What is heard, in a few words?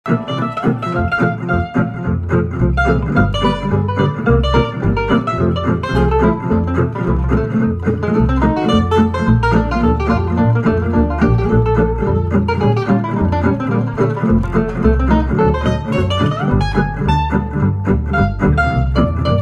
reverse la pompe with bass